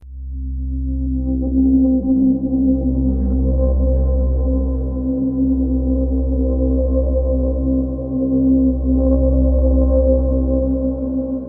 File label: Exploration Stinger tones